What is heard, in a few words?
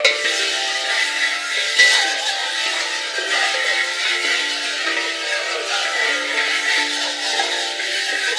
GLISS; Xsample VOL-4